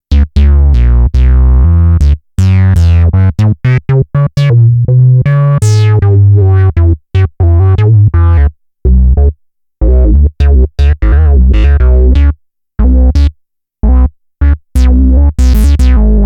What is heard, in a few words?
monologue